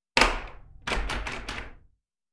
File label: Doorknob Rattling; horror sounds; Hollywood Edge